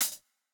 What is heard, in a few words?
Jrock hihat